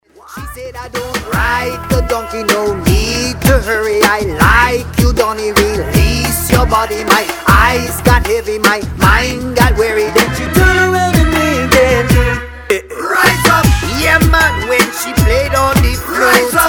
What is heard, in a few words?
world reggae